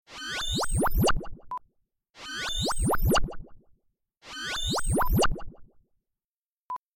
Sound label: Zapper Whoosh